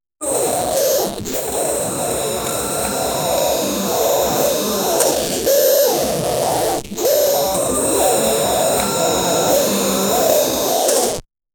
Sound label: sound 1jz gte vvti, automobile